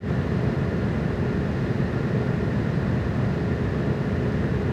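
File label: aircraft atmosphere; Total Control - Action Cutz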